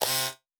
servo small, sci-fi sounds, mechanical

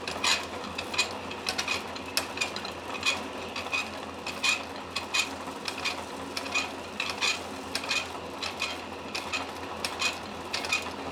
engine loop, sci-fi sounds, mechanical

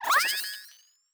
Device Start, Electric

